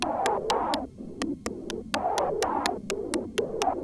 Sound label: Juno-60 Arp 125BPM